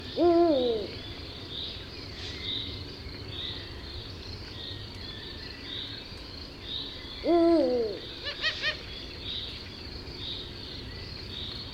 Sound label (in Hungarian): uhu Bubo bubo